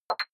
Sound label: click fluffy chink